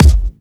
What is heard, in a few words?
Bassdrums Hard Kicks; Vengeance Samples